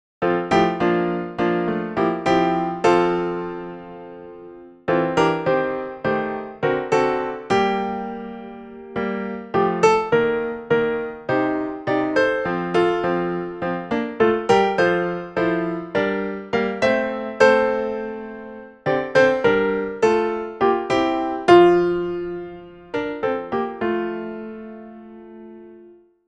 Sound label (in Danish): alle stemmer